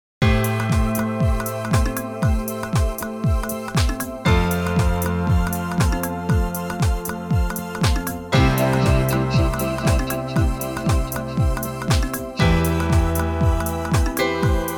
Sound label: instrumental